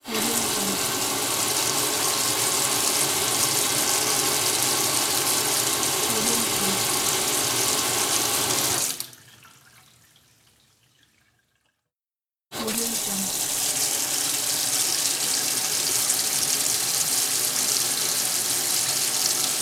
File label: water in the sink